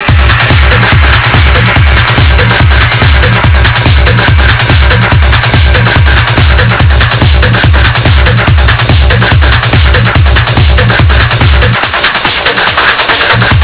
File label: beat; svencoop; megamaze